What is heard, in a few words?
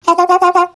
Wubs